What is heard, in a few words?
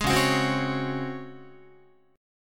C sharp Major 7th Suspended 4th C sharp Guitar; C#M7sus4; strummed